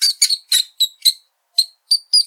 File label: rat squeak